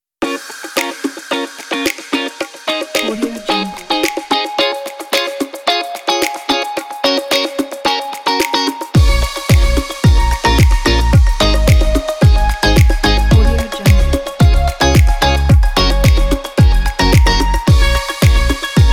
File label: tropical dance